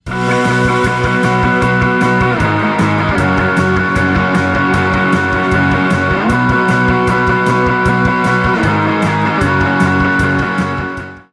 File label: Church Melody; church melodies volume 1